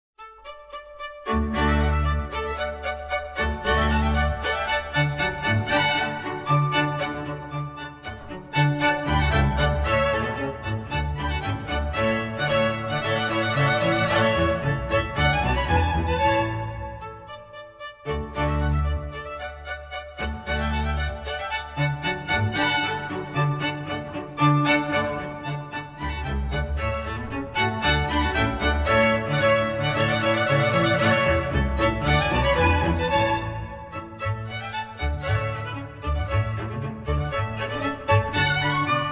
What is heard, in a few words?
String Orchestra; Music for the Royal Fireworks, HWV 351; IV. La Réjouissance; Arr. for String Orchestra